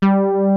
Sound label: UNIVERSE, MINIMOOG